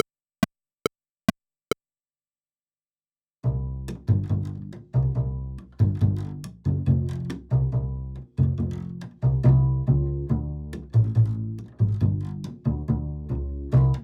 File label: Bass